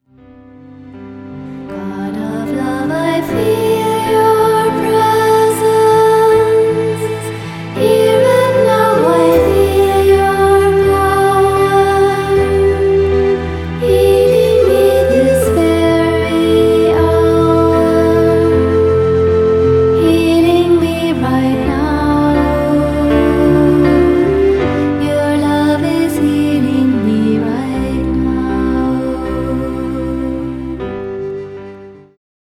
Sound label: Healing Song